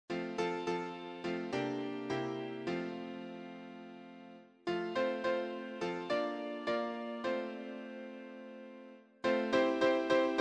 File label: piano